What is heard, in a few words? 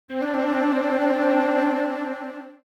Cartoon flute trill